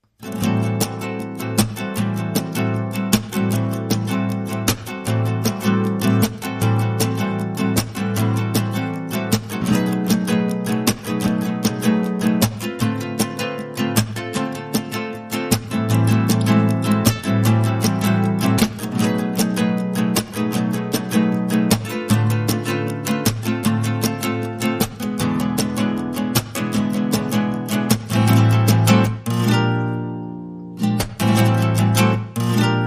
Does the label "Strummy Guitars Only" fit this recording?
Yes